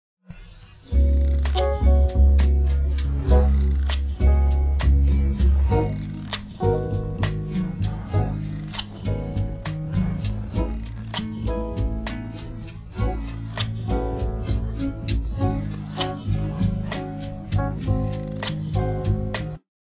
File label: no voc